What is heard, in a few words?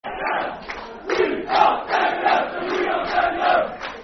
Football Chant (Soccer Songs); We Are Dagenham; Dagenham & Redbridge Drfc